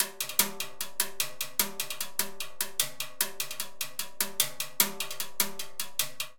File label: Son Montuno Timbales 150bpm, Latin Percussion; Ableton; Factory Packs